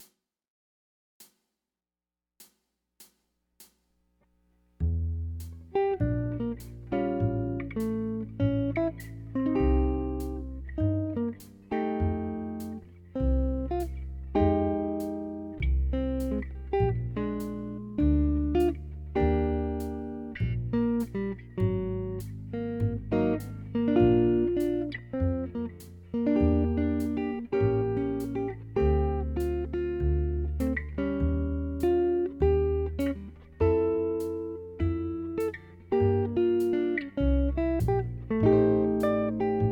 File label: Stella solo